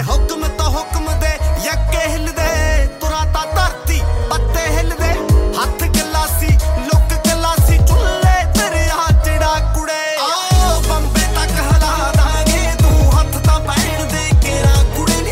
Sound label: new punjabi ringtone